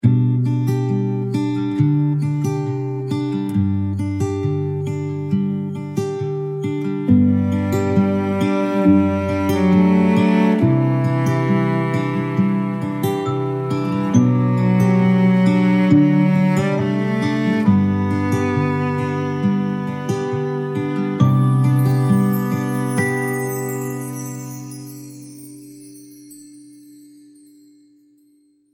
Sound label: cozy hearth melody